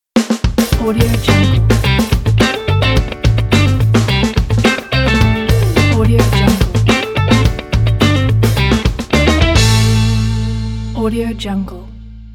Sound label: funky logo; rockwaveaudio